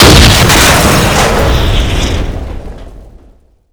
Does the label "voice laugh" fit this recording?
No